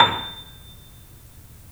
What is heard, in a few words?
The Piano, A; InVision Interactive - The Piano; BOESINGSOFT